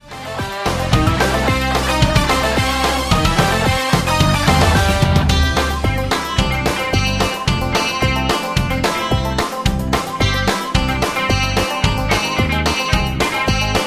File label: karaoke